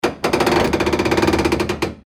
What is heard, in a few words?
door squeal